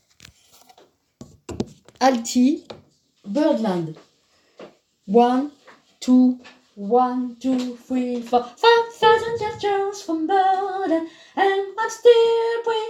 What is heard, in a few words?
alto